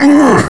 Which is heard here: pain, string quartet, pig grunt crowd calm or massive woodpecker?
pain